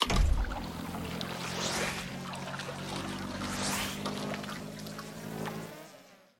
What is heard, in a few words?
Fusebox Switch; Puzzle